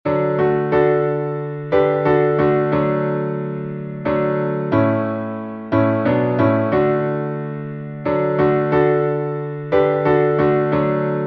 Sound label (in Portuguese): salmo playback